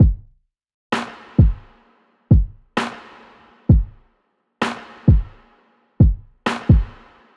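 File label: Pop Kit 130bpm Stripped, Drums; EDM Drums; Drum Kits; Stems; Ghosthack; Drum Mega Bundle; Ultimate EDM Drums; Pop Kit 11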